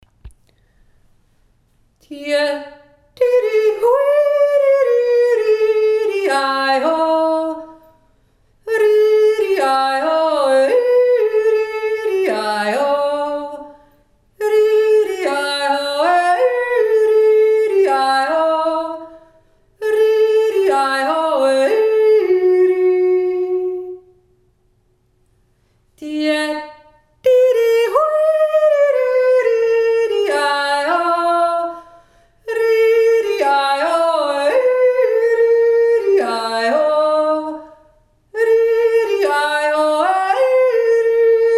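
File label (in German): Jodler, 1. Stimme; 2. Stimme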